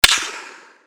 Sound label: snap; stronghold